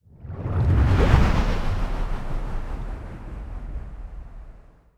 colony ship move; aquatics

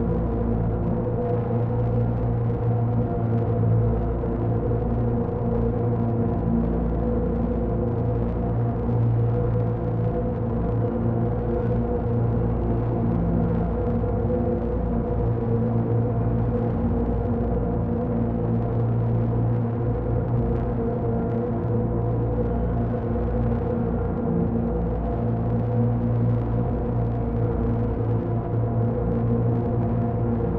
ship colossus idle; Stellaris; shroud; ships

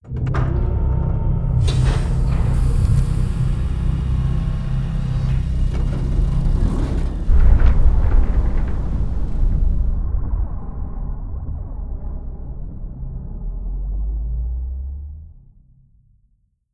humanoid colossus closing; apocalypse

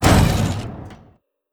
queuing ship; Stellaris

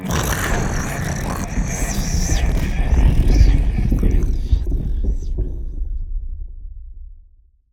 lithoid portrait snake; lithoids; Stellaris

